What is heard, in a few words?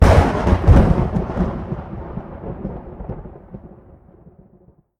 thunder; tetrio